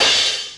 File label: CRSH, Beatmachine; Hollywood Edge; The Big Bad Beatmachine; XE-8